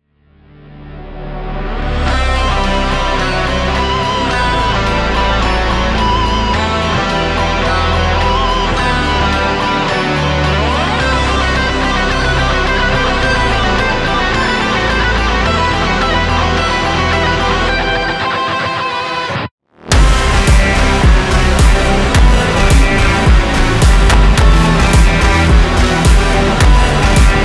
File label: Funk, rock Version, Slowed